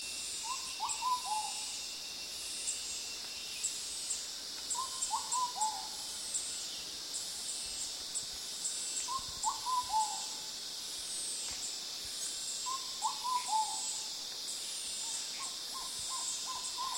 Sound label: Ruddy pigeon; کبوتر گلگون